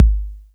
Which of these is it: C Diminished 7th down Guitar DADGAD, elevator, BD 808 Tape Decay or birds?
BD 808 Tape Decay